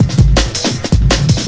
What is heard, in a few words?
163 gated community, drum loops and breaks